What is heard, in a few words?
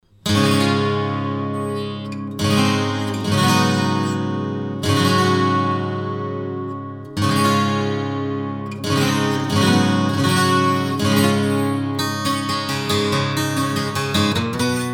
yamaha fg 230